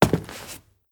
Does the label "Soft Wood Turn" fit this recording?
Yes